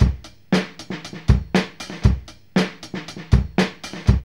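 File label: 118 bpm, Funky loops; Zero-G; Funky Elements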